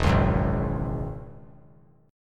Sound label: E 6th Add 9th E down; E6add9 strummed; JGuitar